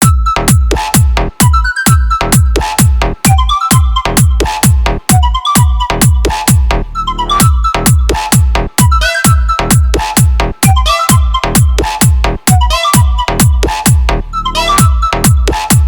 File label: Punch Music And Competition Dialogue Beat, Hindi Dj Song